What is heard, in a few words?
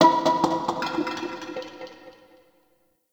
Asteroid Close Metal Hits; Warframe; Grineer Asteroid